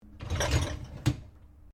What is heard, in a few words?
Dishwasher Dishlex Top Tray Loaded Open, Household dishwasher; Appliances; SoundEffects Foundation2